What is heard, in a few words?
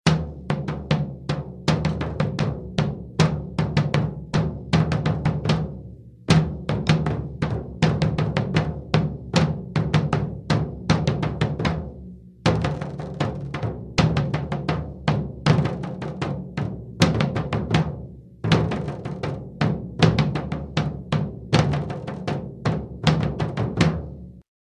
drum